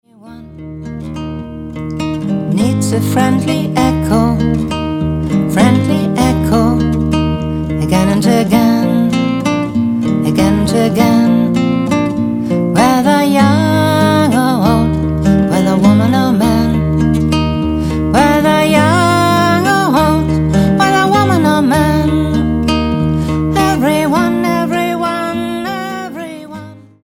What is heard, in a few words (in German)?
Echo